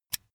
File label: pull out usb cable